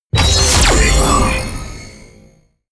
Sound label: sci-fi click generic confirm medium; hits impacts